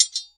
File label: sword hit